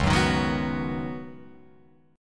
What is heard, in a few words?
Db 7th Suspended 2nd Suspended 4th Db, down, Guitar Open F; strummed; chord shape; JGuitar